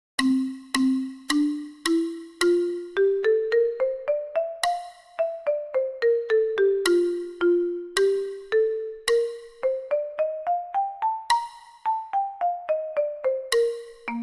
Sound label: Mallet Percussion; MUS125D Mallet Percussion Proficiency